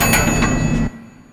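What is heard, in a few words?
dungeon elevator; Etrian Odyssey I HD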